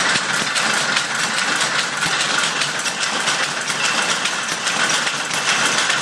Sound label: the sound of just two looms; quarrybankmill